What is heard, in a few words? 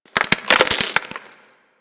Ocean Glass Crack Small; Ambience; Warframe; Grineer Ocean